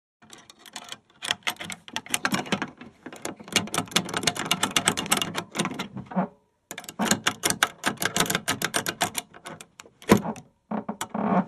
Picking Car Door Lock; accessories locks

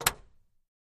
Camaro Door Close Lig, chevy camaro

